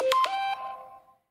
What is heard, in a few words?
iPhone 12 Aurora iOS 14